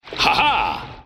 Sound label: hahah